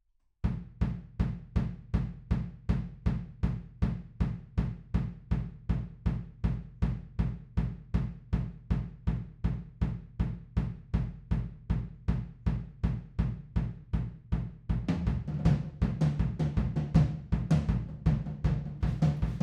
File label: Room, 160 BPM